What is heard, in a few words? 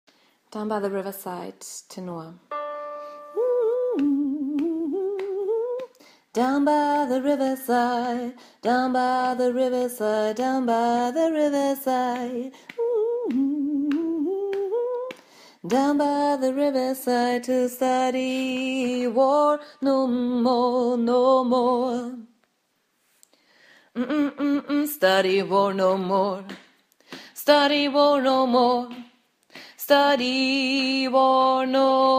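Down By The Riverside Tenor, gospel choir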